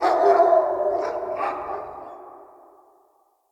Pack Wolf Appear Bark; Animals

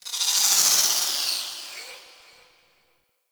Sentient Lair Exterior Creature; Warframe

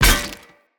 Sphere Polearm Medium Impact Flesh Armour Hollow

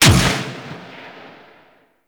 Crew Ship Turret Shot 2D; Warframe; RailJack

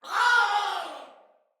Death Soldier Female; Battle; Barks; Dialog; Sinecure Soldier Female B

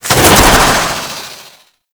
Manic Dodge Movement, Foley; Warframe; Grineer

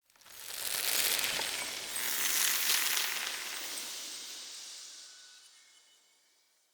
Eternium Garden Spirit Seed Grow

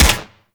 Infested Swarm Rifle 2D Shot

